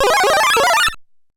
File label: HEN LARGE; SMB1 Sounds